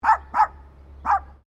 Animal Dog Poodle Miniature Bark Sequence; Various domestic dogs; Animals

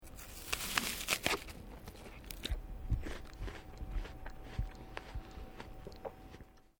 MALE EATING APPLE BITE CHEW; Male Vocal sounds; Eating (Sound Effects:Human)

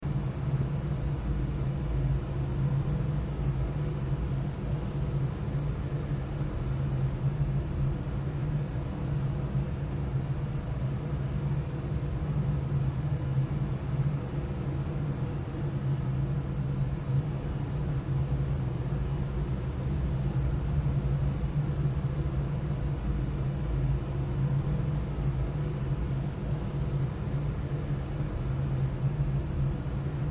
Air Duct Ambience Rumble Room Tone Loop; Factories; INDUSTRY; Blastwave

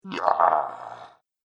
Orc Satisfied; horror; Monster Sound Effects

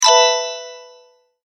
multimedia tone correct

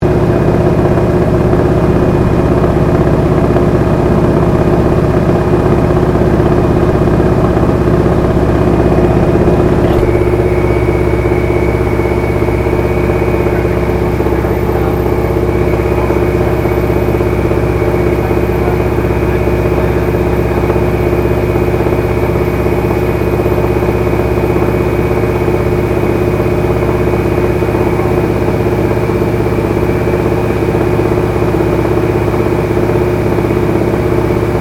AIRCRAFT AT-6D HARVARD MK III FLYING INTERIOR; Harvard Training Aricraft; Airplanes; Vehicles

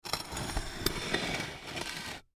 Plate Slide Wood, Plate Slide Wood Table Dish; Household; Dishware

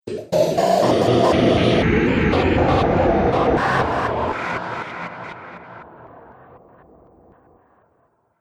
Ghost Glitcher; Ghost Sound Effects

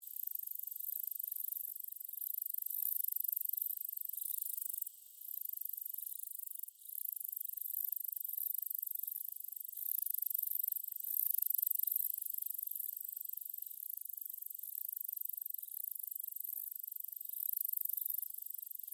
Ambience Japanese Summer Insects; Ambiences generated from original source material constructed in FMOD; Environmental Sound Effects

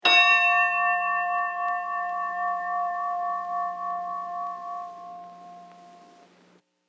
Ship Bell One Ring; Boats; Sound Effects:Vehicles

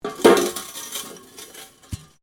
METAL PIPE FALL; Materials

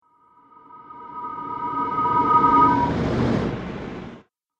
Fantasy The Wizard Vanishes; Magic Sound Effects

